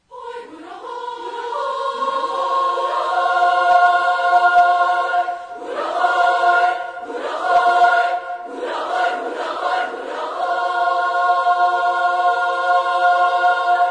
chorus; Macha; Hoj Hura Hoj

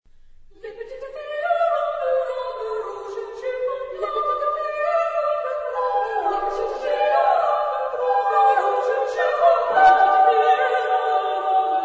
Chansons, chorus; Martin; Le petit village

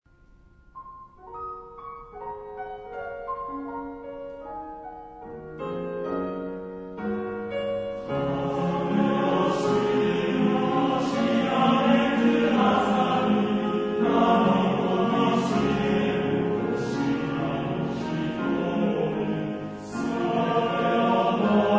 male, chorus; Matsushita

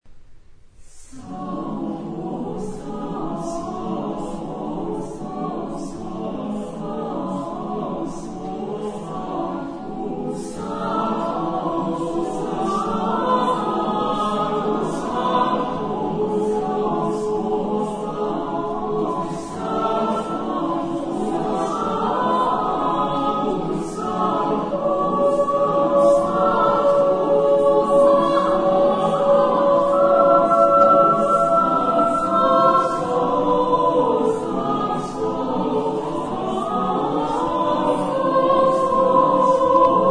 Sanctus Benedictus, chorus; Matsushita; Missa Tertia

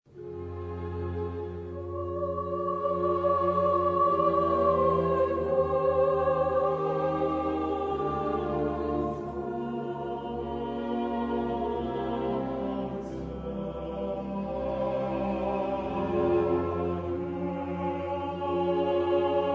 Cantata, chorus; Bach; BWV12; Weinen Klagen Sorgen Zagen

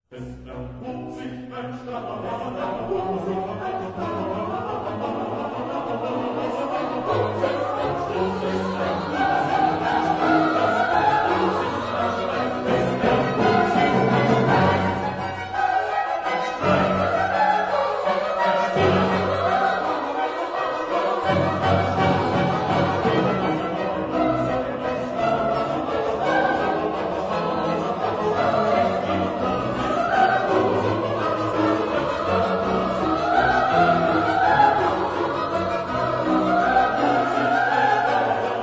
Cantata, chorus; Bach; BWV19; Es erhub sich ein Streit

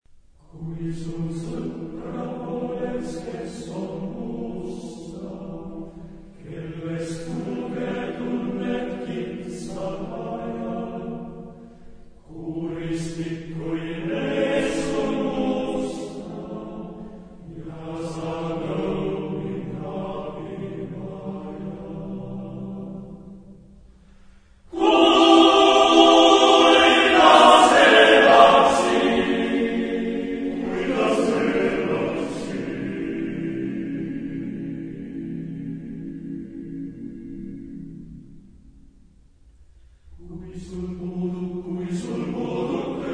chorus; Magi; Kuidas elaksid

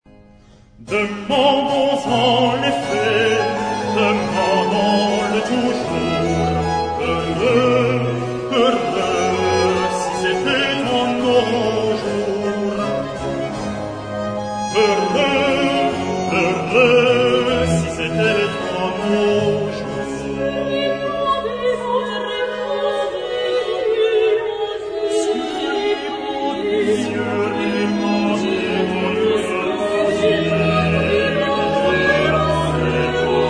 Pastorale, chorus; Charpentier; Cieux repandez notre rosee

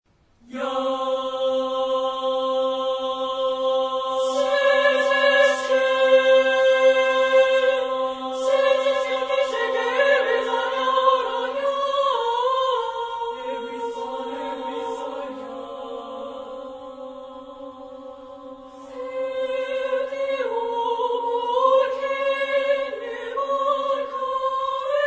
Viragsirato (female), chorus

